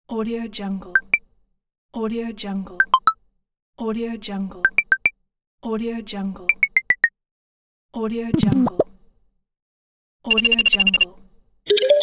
updated notifications

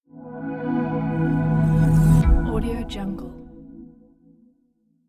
futuristic logo reveal

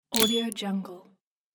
application menu

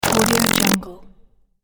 tiny bot powerup

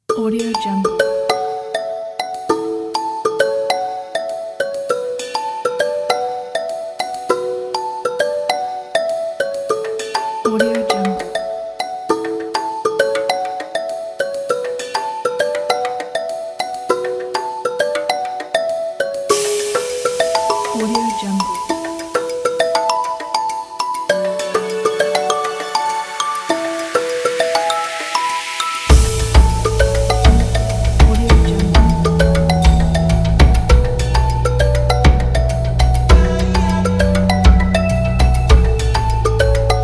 africa bells

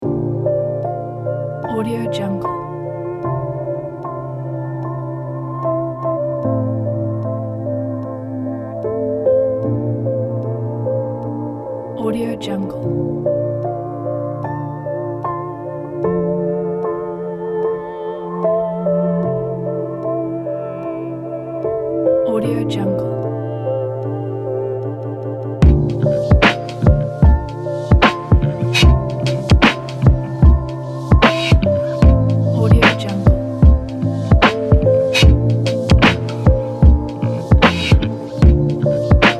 lofi hipe

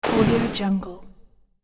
gameplay thud

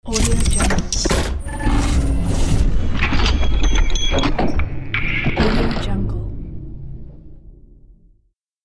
transforming logo

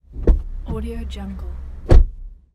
car door open and close from inside

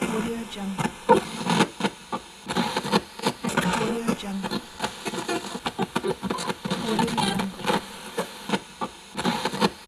the tuning radio; fx-library

